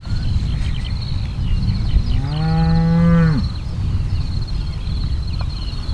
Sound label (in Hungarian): viszonylag közelben, őstulok; Hortobágyi Nemzeti Park